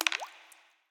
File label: gem Splash; imperialriches